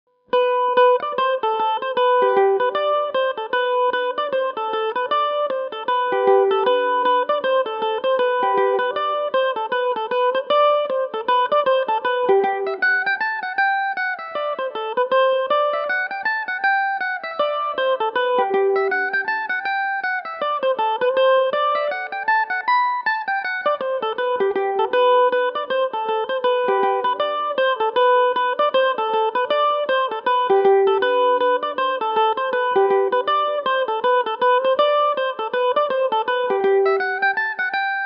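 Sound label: G major revelation, Irish mandolin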